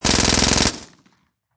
Submachine Gun Uzi 9Mm Long Burst Close Perspective